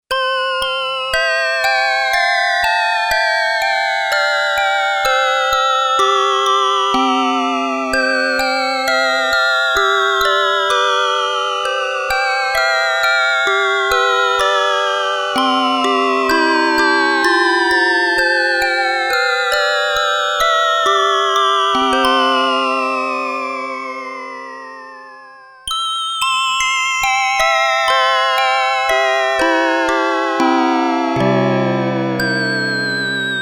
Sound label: twinkle music box, Korg DW-8000; Factory Patches